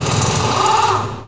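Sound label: Impact big, air tools, industrial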